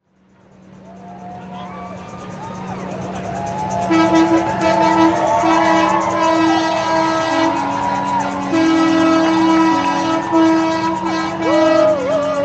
plow trucks honking

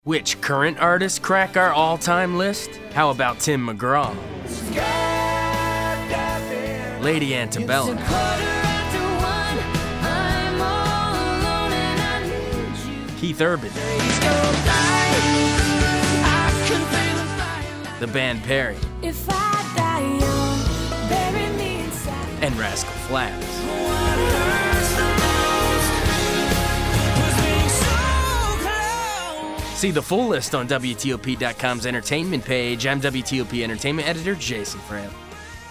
COUNTRY MUSIC

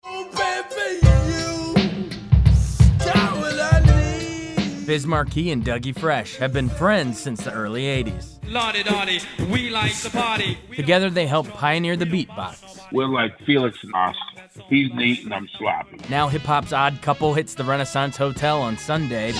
BEAT BOX

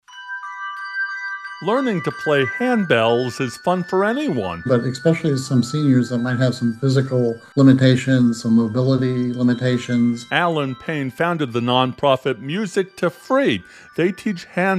handbells

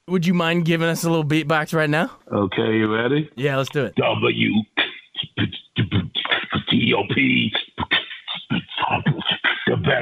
beatbox

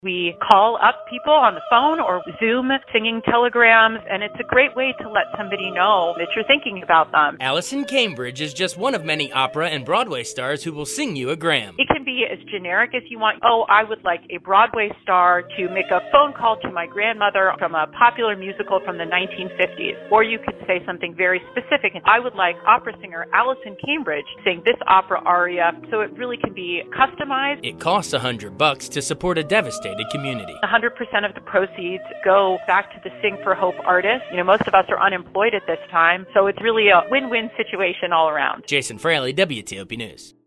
singing telegrams

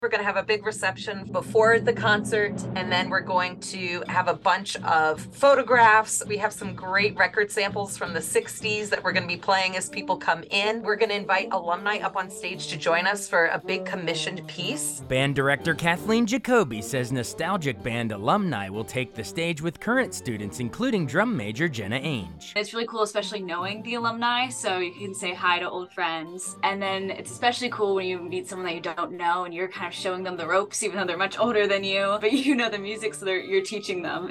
HIGH BAND; HERNDON

